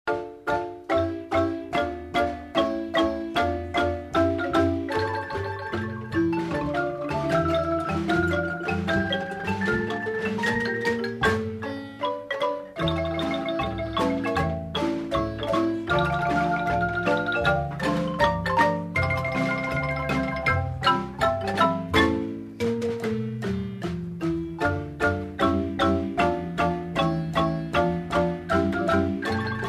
Marimba

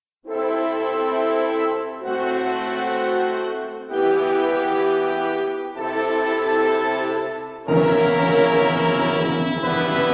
instrumental

